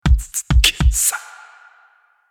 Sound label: Beatboxr